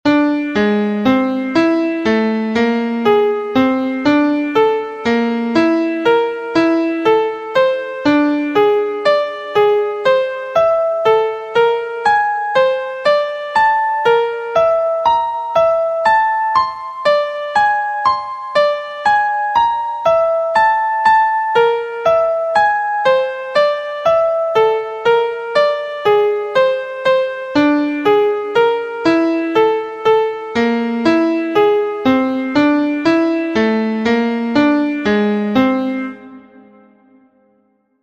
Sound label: Degree 1 b2 2 b5 5 b6 Atomic Scale Key Ab; harmonic and melodic equivalence; trichord pair